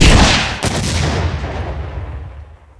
rocket fire; svencoop; weapons

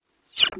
nvg off; firearms

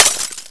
glass, debris

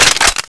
reload; dod

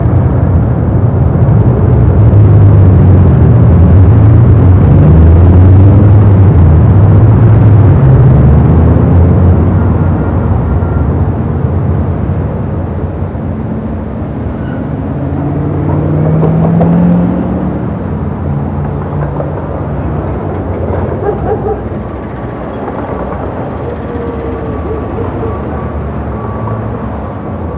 city ambience

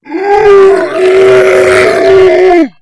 zombie alert

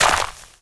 player dirt; svencoop

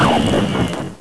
garg flame off; svencoop; babygarg

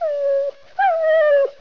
die

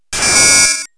laser; svencoop; breakfree3

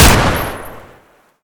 smg fire; svencoop; deluge; weapons

